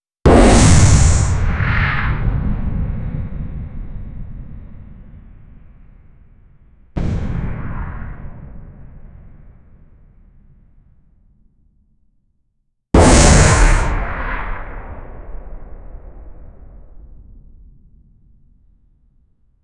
shots from a powerful sci

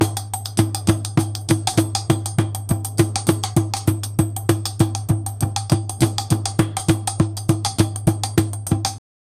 earth shaking rhythm of s, indonesia

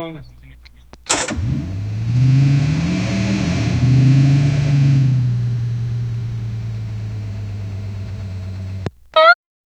aggressive cold start of, performance

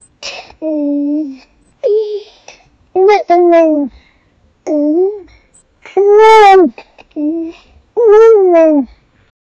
happy child

